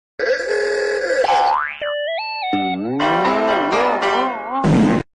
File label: goofy ahh sounds